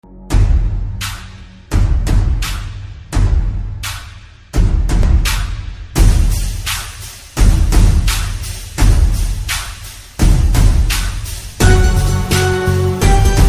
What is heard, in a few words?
Theme Drum Beats Ringtone; Tanhaji